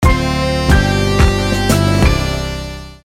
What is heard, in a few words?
Groovy; The Voyage variants